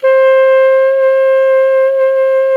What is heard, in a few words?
C 4, clarinettino; SoundBytes - Early Patches